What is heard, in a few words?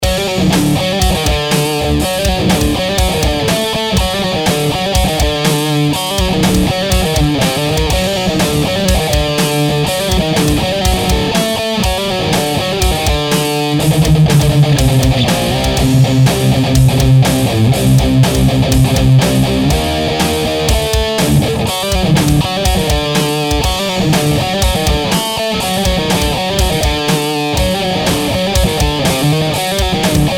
Instrumental